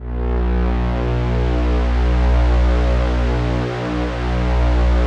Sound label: ATMO PADS; Best Service; XX Large - Pads